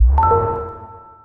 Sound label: open